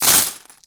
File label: coins bag